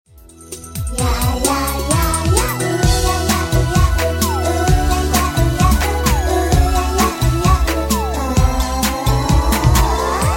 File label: Karaoke